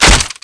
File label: knife hit